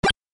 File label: fireball